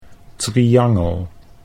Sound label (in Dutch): triangel